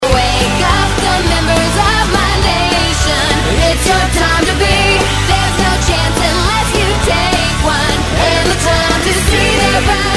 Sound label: ringtone; iCarly